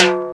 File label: Timbale, Roland TR-505; Drum Samples